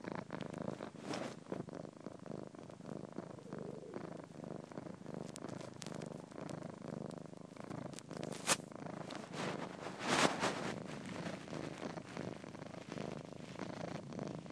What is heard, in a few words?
purr, cat happy; testing bass sensitivity